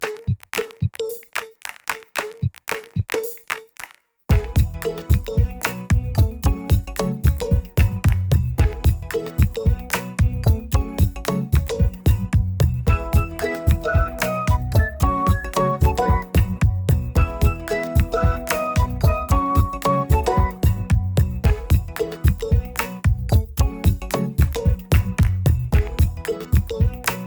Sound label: dopamine ukulele